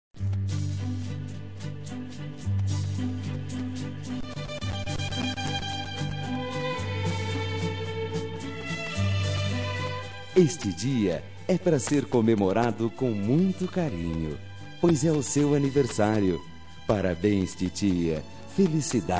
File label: Instrumental